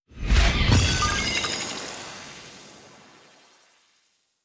Enchant Absorption Cast

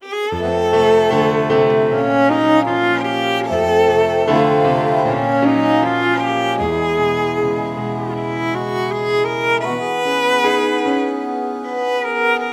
Loop; The Act; Arcade, iOS, MacOS, Mobile

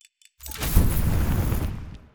Fire Trap; Ion Shift

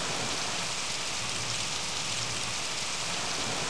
Waterfal

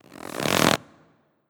Attack Stretch; Tracker

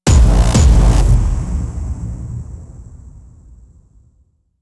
Powerup Collected Stinger

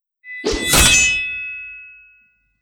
Metal Shields Sword Impact

